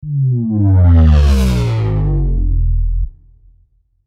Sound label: sub drop